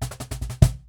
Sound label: Cajon Fill; musicradar